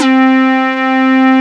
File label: PL MOOG; Wizoo - Powered Nord; STEREO LAYER